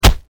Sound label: body hit; Mono